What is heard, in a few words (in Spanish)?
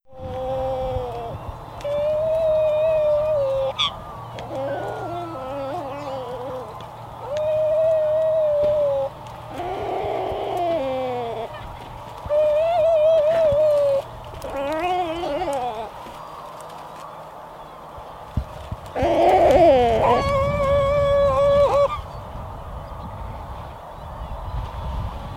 Pterodroma incerta - Petrel cabeza parda